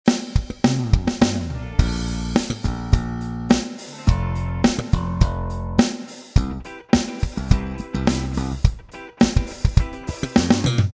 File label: 80s LA Slap